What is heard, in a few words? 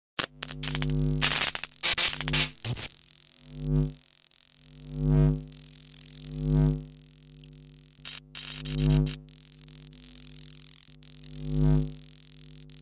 wires sparking